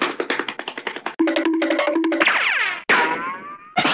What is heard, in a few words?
cartoon run sound effects